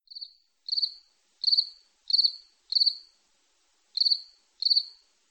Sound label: single cricket chirping